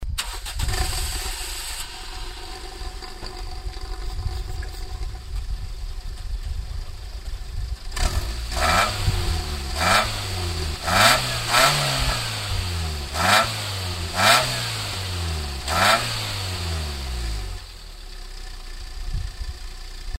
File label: exy10 normal, muffler; car